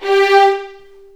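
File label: G4 violins; The Ultimate String Collection; East West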